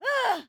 Female Warrior Scream, Battle, War, Cry, Fight, Shout; 344 Audio; Medieval Battle